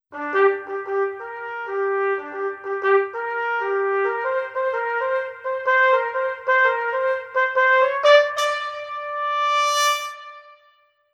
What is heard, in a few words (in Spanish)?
trompeta